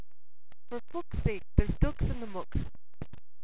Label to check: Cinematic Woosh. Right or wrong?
Wrong